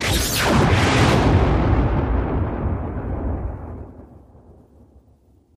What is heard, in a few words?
Blue Coil Extract Sp, arcs & sparks